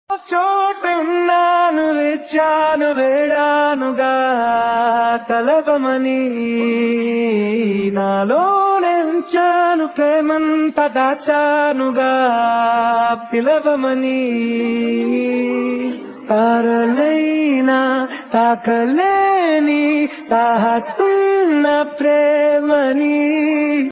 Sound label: ringtone